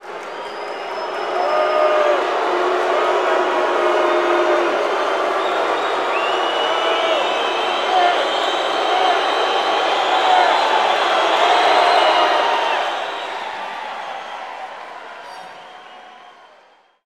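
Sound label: basketball crowd jeer; Crowds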